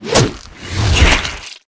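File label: Big Mud Man attack; Gamigo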